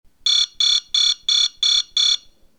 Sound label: alarm quick bell